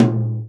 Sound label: tom, Real Drum Kit, jungle kit